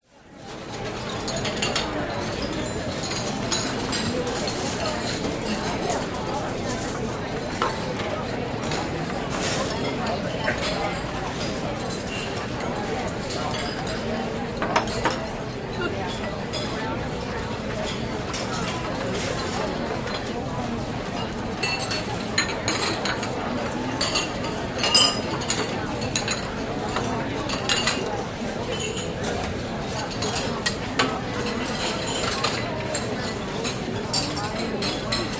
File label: Restaurant; audio hero